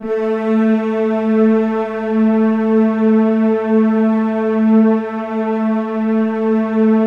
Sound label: A2, Keypad; Standard Instr; Keypad 3